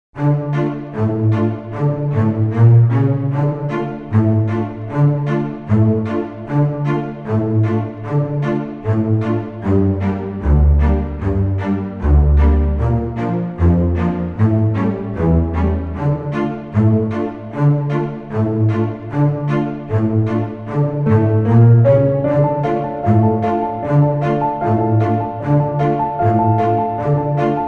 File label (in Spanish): danza turca